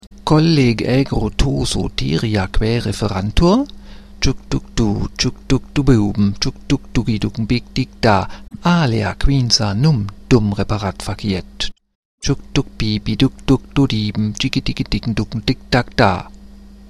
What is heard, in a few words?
rap